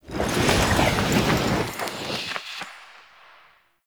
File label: Movement Whoosh; Enemy; Mendicant; Mnd Wazard